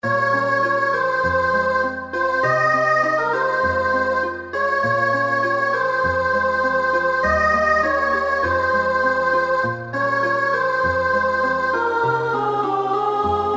Chorus Sop

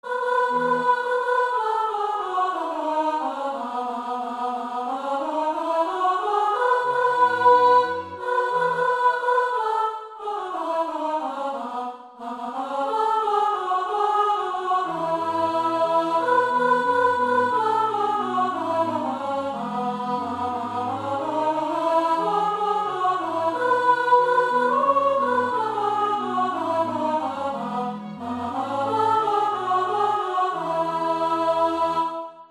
Final Chorus & Verse Sops

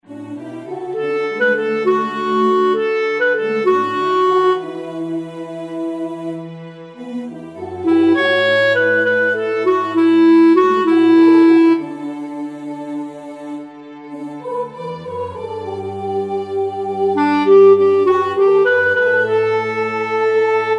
Clarinet

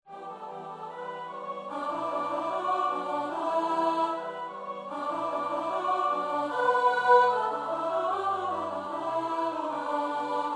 Alto

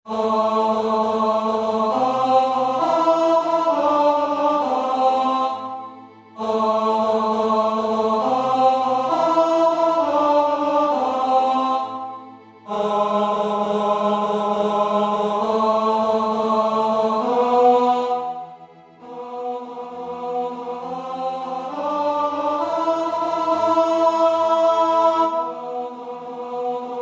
Tenor

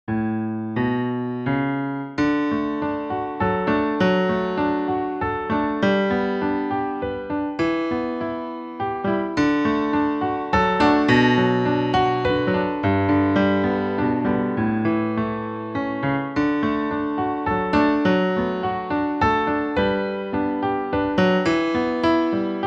Piano

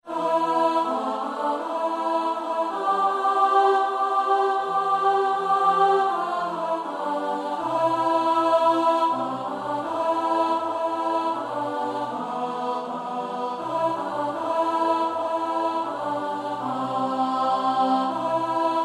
Alto

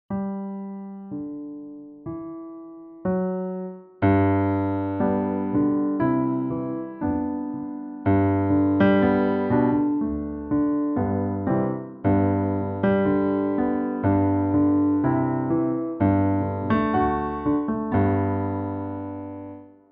Piano; Sitting in the stern of a boat

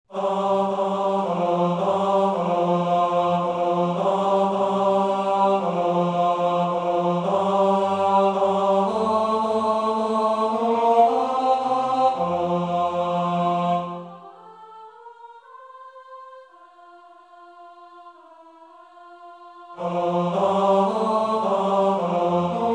Susanni Tenor

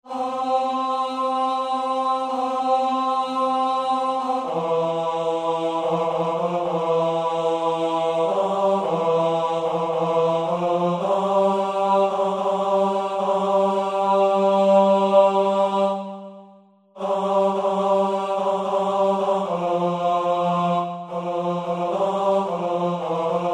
Tenors

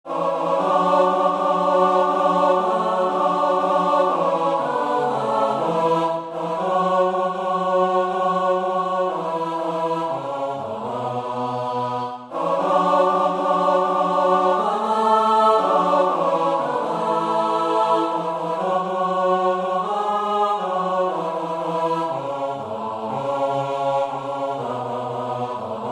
Verse + Chorus All

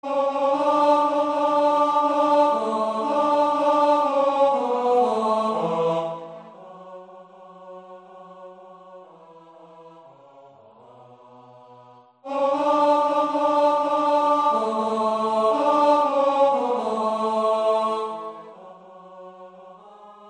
Tenor

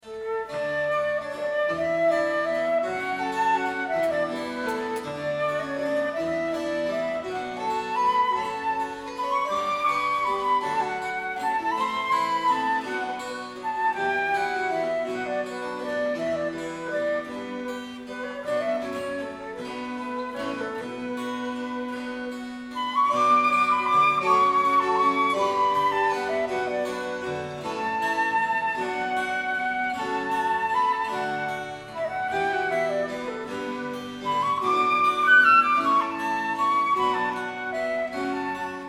Whistle; Mother Hubbards

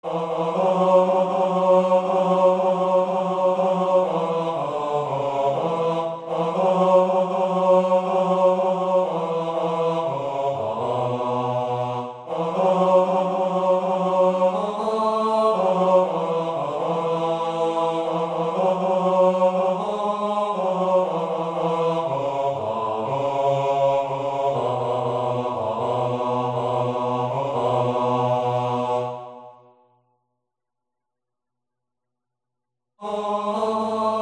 Verse + Chorus Bass

